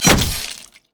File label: axe grab; Darius